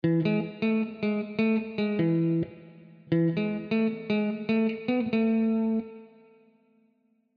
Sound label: strato wet melo; Chiqui Audio; Melody 57 155 Bpm E Maj; Fender Stratocaster